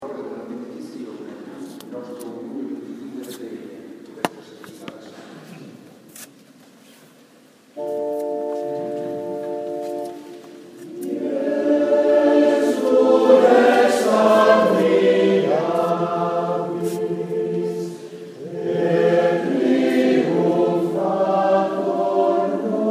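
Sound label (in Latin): jesu rex admirabilis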